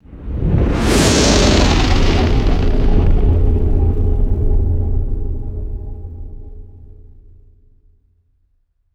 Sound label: mysterious atmospheric synthetic swell and fade; swooshes